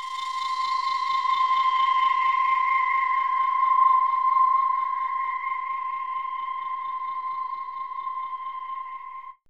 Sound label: FLUTER FX; AMG - Vince Clarke Lucky Bastard